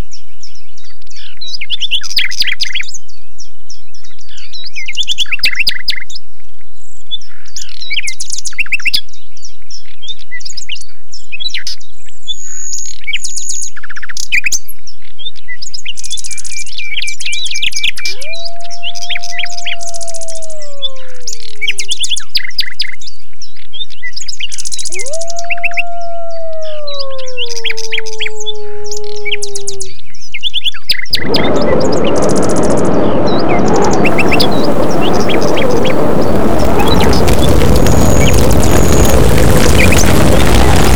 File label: Sonification of Network Traffic Flow